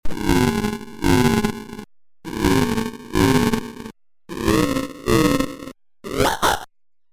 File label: alien first words pitched animal sounds